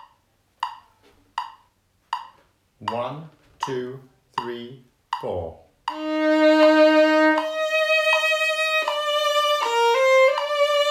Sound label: Rainbow Violin